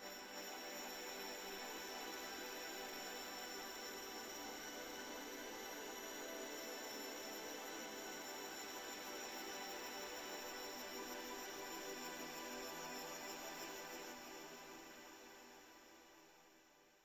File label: reverse decay pad em